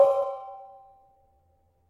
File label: Barung C sharp 5, gravity harps tuned